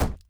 wall hit; hotline miami

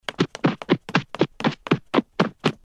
two people running

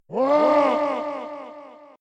roar

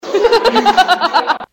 devil laugh

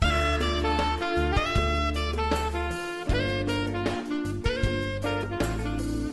saxo

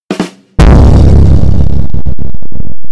ba dum tss bass boosted

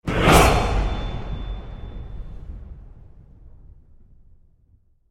new game; skyrim

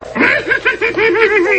ringtones

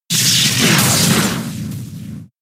spark

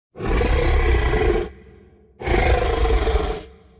rumble

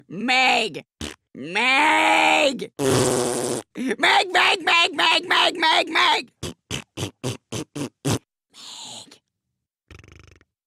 fart sound

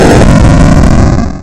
jumpscare sound; one night at freddys 2

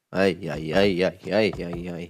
ayayayayay

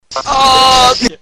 ooooooo

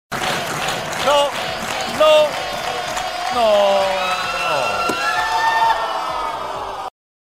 nooooooo

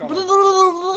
blblblbl

